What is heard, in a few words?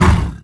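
Mud Man damage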